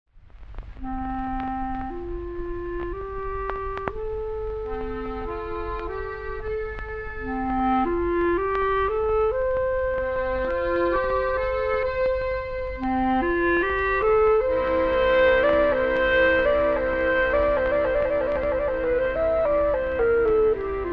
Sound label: Waltz